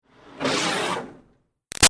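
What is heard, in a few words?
Water Spray Interior Car Grill Med; Car Wash; BigRoomSound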